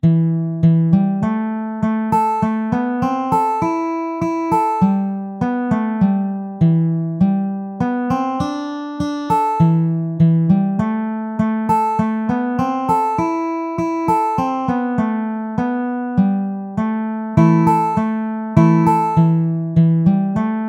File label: frosty morning, banjo